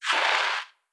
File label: Bike Stop; blue archive